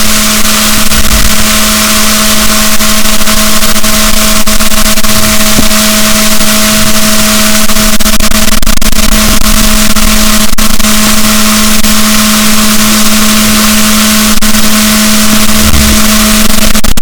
rorquals, boat, Balaenoptera Physalus; SNR 6dB

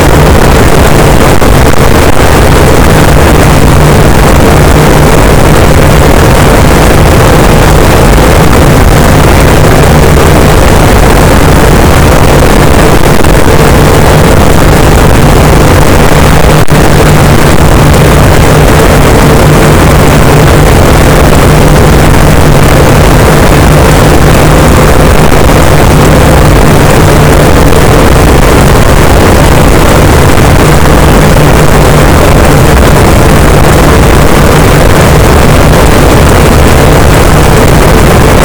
cachalot negatif, boat, Physeter Macrocephalus

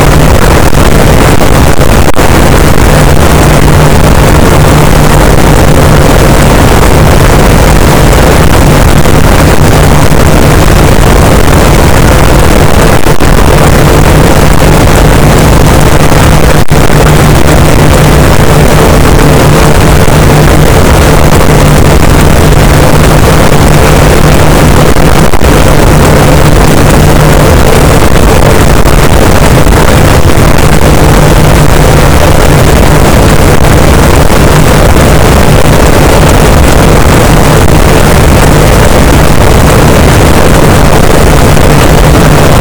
cachalot positif, boat; Physeter Macrocephalus

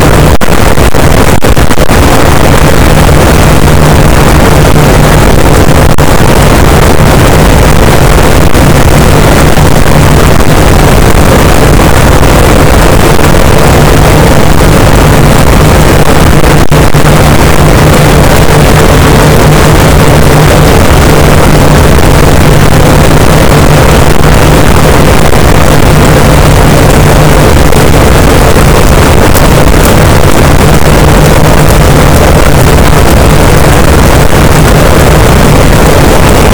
cachalot positif, boat; Physeter Macrocephalus

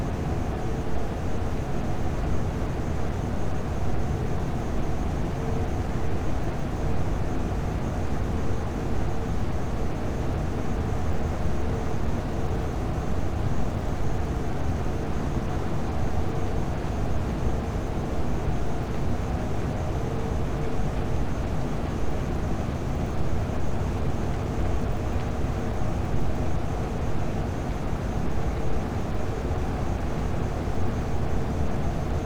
cachalot negatif, Physeter Macrocephalus

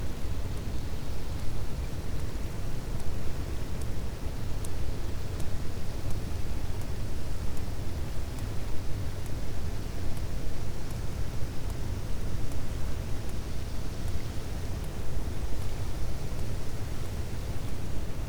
cachalot positif, Physeter Macrocephalus

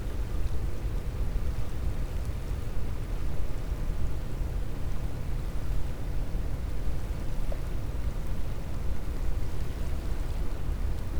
cachalot positif, Physeter Macrocephalus

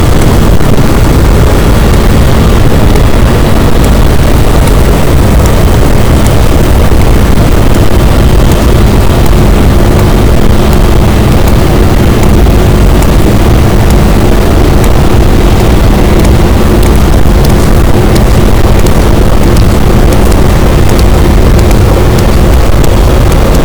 cachalot positif, boat, Physeter Macrocephalus

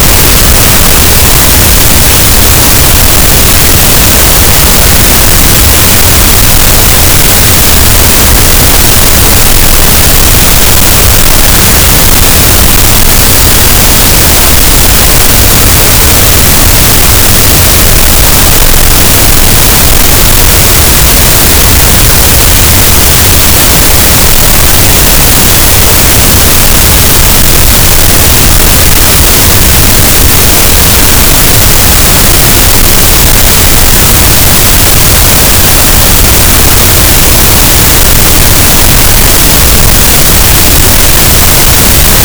cachalot, Physeter Macrocephalus, gaussian noise

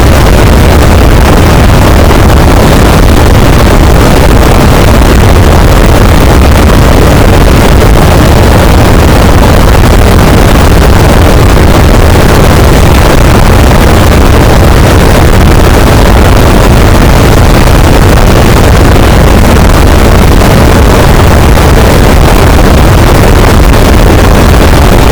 cachalot negatif, boat, Physeter Macrocephalus

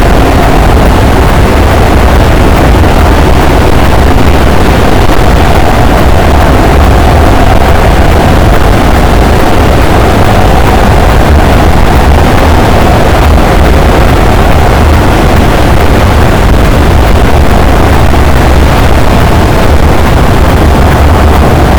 cachalot, boat; Physeter Macrocephalus

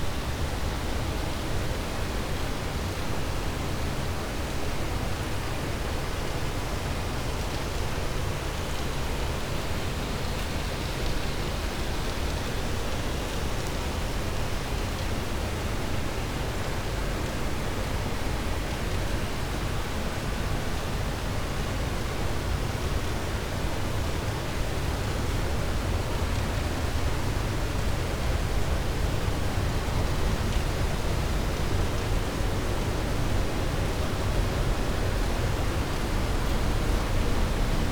cachalot negatif, Physeter Macrocephalus

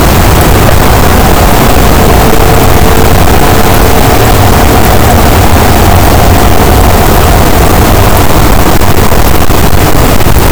cachalot, gaussian noise; Physeter Macrocephalus

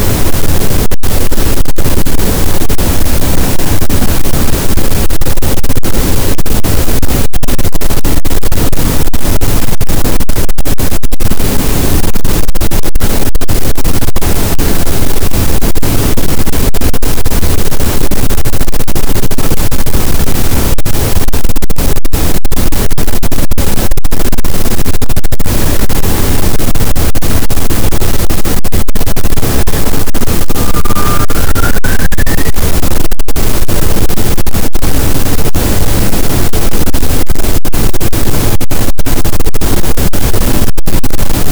cachalot, Physeter Macrocephalus, gaussian noise

